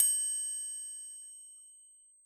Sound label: 909 kit triangle